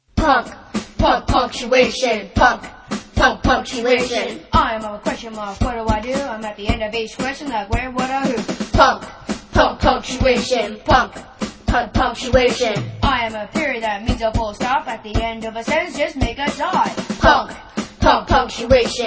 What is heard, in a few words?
punctuation rap